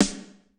snare, snare house

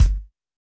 kick, house

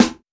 Rock snare; Reference Beat

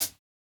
hihat, house

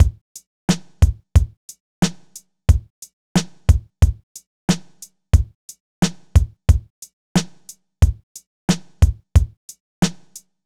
90s hiphop loop 90; Reference beat bpm90-A

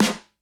snare; Pop; funkvol1

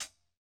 Modern hihat, Electro

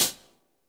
Rock hihat; Reference Beat

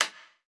snare, snare house

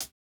hihat, house

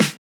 snare; Electro; futurefunk

